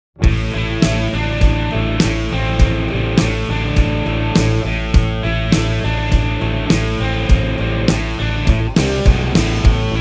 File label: guitar sound